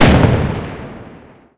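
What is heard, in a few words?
breda safat 77 gun shot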